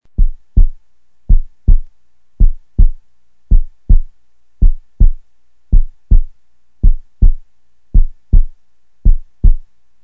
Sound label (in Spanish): latido medio